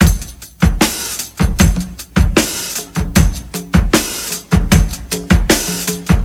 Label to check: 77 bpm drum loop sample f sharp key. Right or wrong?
Right